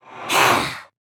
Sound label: Grunt Fast; Realm Royale; Hunter